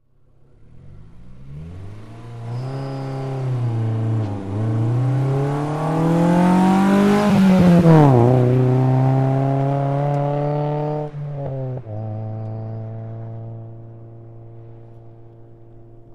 Integra Rev Accel By, acura integra ext.

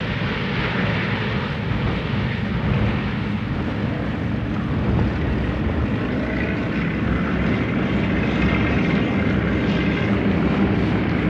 B29 Field BG Idles Rev, b 29 superfortress

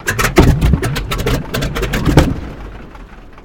Plane Engine Almost S

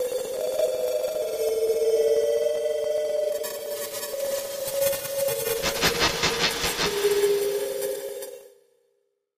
Stellar Wind

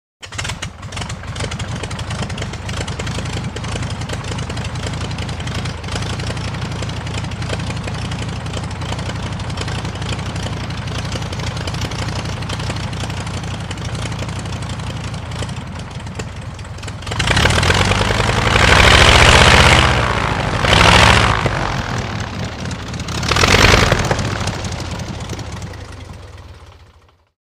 Prop Plane Start Idle, vintage

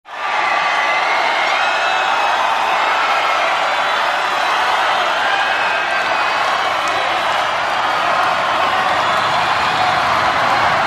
Large Crowd Cheers, concert

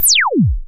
laser; lasers

